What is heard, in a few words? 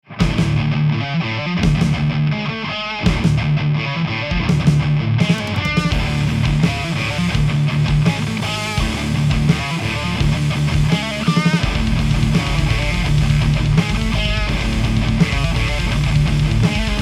METAL